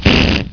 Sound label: fart